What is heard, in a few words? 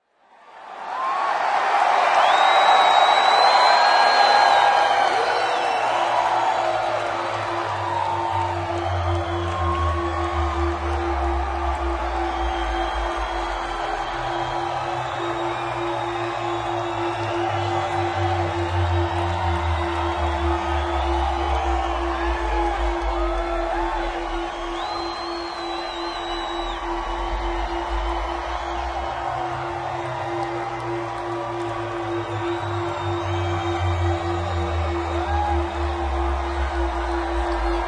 Bass Note Intro